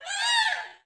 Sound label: catch virus; ICTS Denmark